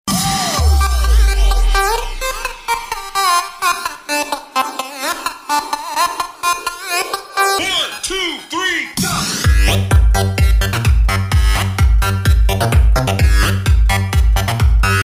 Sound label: original mix tiktok